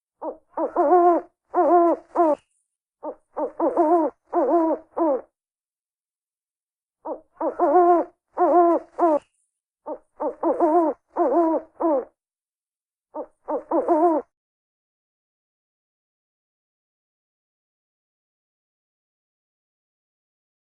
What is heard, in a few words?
Sounds of owls and short owls